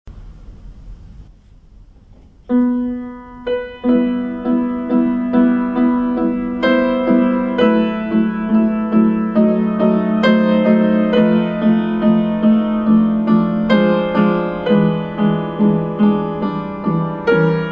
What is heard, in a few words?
Prelude In E Minor; Chopin Frederic; Op. 28